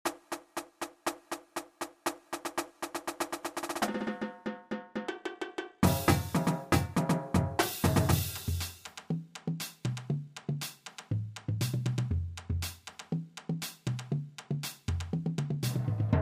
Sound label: Samba Groove